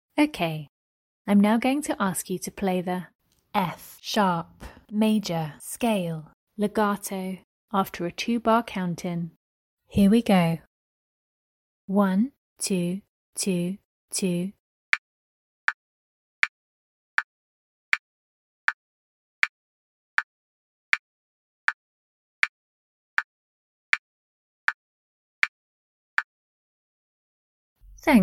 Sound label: f sharp maj scale legato